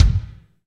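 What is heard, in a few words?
bass drum, Roland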